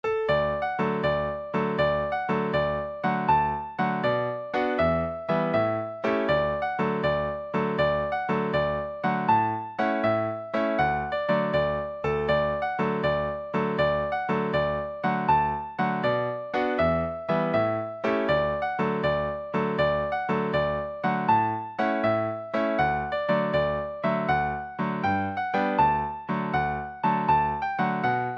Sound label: bugle horn